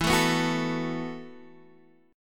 E Suspended 2nd Suspended 4th E, down, Guitar, Standard; strummed; JGuitar